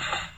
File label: beaten; Magic-Tower